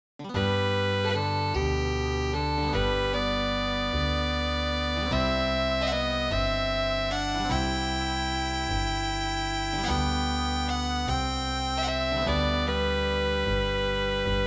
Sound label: lullaby; st patricks